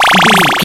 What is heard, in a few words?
retro laser gun shoot